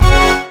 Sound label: bonus chance; Roman Legion